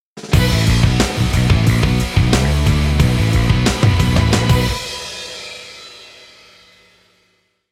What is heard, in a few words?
gameover